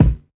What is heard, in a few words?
long bass drum sound a sharp key; kick drum samples